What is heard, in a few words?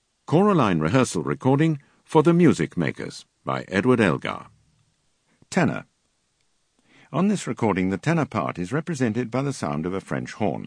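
Tenor; Elgar Music Makers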